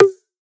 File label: Perc; Sample Banks; Percussion